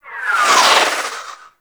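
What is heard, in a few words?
Super Weapon Missile Projectile By; Warframe; RailJack; Proc Ships; Corpus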